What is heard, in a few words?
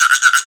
Wood, Percussive FX; musicradar; cartoon caper